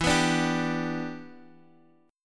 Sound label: E Minor 7th, down, Guitar, Half Step Down